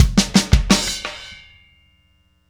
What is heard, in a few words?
Static Beat Ending; Sonic Foundry (Sony Creative Software); Indie Rock Anthems; Session 13 - Static